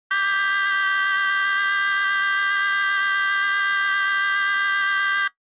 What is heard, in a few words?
Retro Anime System